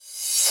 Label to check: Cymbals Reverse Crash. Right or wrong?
Right